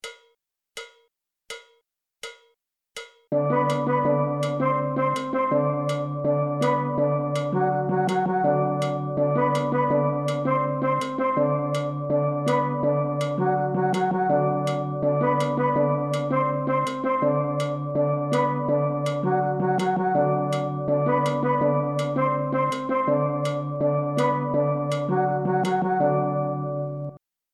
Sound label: guitar C